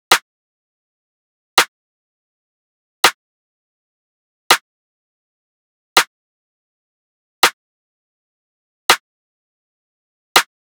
Drum Loop, 82 BPM Rim; Cymatics; Vibes II Drum Loop Collection; Distance Drum Loop

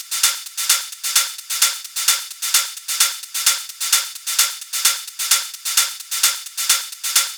Hat Loop 130, Techno; Ghosthack; Free Techno Sounds 2024; Hats

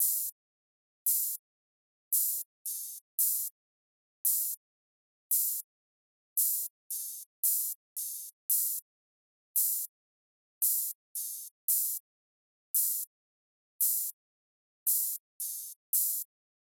Drum Loop - 113 BPM Open Hat; Loop Stems; Cymatics; Bermuda Pt 2 Drum Loop

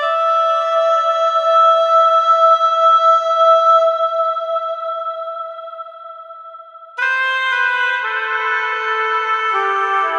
Oboe - Fm 60Bpm; Construction Kits; STEMS; Ghosthack; SkyRealm; Kit 04 - High Rock View

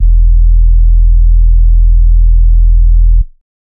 Sub E, Dubstep; Bass One Shots; Ghosthack; Dubstep Sound Arsenal; 50 Bass One Shots

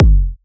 Techno Kick

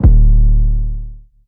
808 D; Cymatics; Oracle Sample Pack; 808s

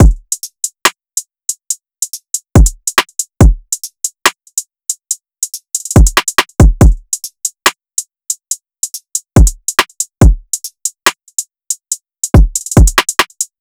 Drill Drum Loop 141 BPM; Drum Loops; Drill King Drum Loop; Cymatics; Cymatics - King Drum Loops (Teaser)

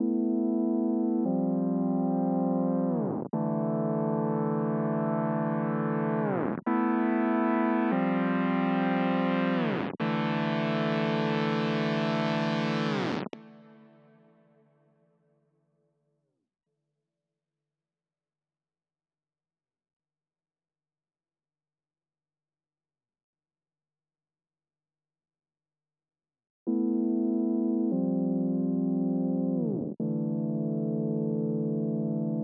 144 BPM A Min Main Saw; Melody; Loop Stems; Cymatics; Cymatics - 2022 Melody Collection - Wavy; Zoo

